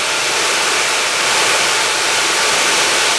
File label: Powder Short; XBOX; Amped 2